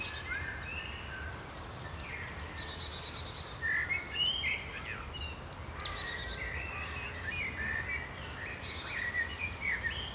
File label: env day